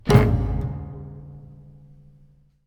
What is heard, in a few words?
hit